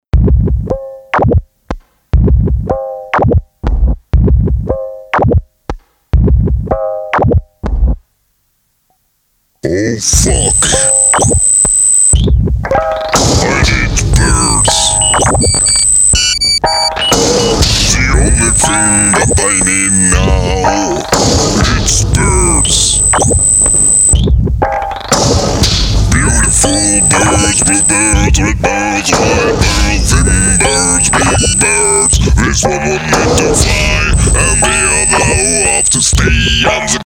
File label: Birds